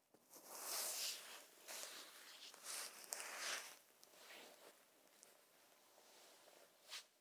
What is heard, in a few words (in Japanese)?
衣擦れ; シックスナインから正面へ; アダルト系